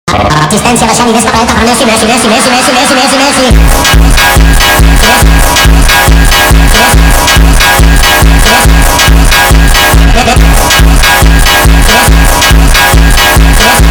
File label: Phonk